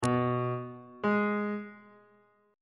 relative minor of c flat major is a flat on bass clef